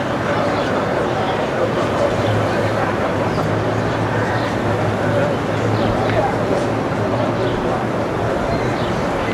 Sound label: ambient port; World Of Warships; clanbase